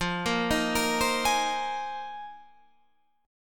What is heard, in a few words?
A sharp 7th Suspended 2nd Suspended 4th